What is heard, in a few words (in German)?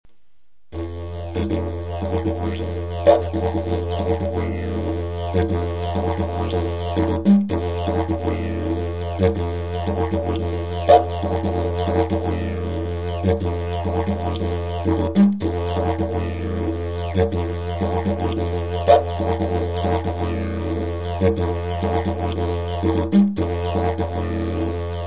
Rhytmus Teil 2 gespielt, Didgeridoo